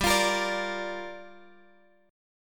G Minor 7th, Guitar, Drop D

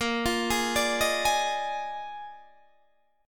Bb 11th, Guitar, Open D

